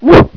barking; Kids Can Save The Earth; Garbage

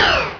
Menu popup; Descent; 250 Desktop Themes

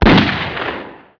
shot; BESTSHOT; noisybit

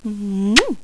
mmm kiss; VEGAS Girls

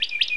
parrot menu command

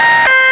Siren Fuzzatronic; Babylon 5

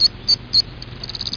Grizzly; Grizzly program open

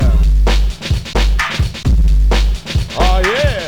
awyeah bpm130, drum&base

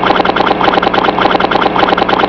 troll

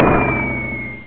Shell Incoming; HyperBattleship!

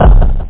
break; penguins

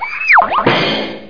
fall comic; DR BRAIN; BUGS

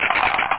skel die; ShareWare OnLine Volume 2 (CMS Software)(1993); monbash

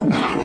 RUCK

